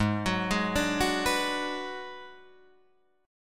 G sharp Minor 7th Flat 5th G sharp down Guitar Drop D